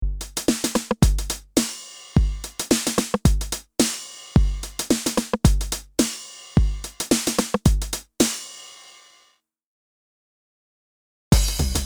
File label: TR RE909; dinsync